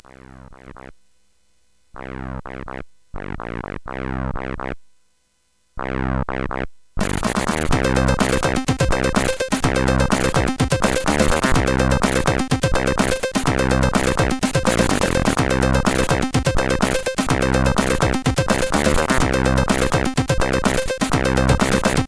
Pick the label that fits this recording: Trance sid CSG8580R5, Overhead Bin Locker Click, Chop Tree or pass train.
Trance sid CSG8580R5